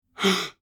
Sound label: Elderly man shocked gasp